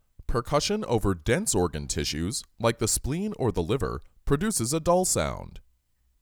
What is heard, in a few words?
Percussion; Ab Percussion